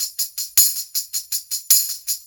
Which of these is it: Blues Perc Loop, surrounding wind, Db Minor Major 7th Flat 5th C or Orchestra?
Blues Perc Loop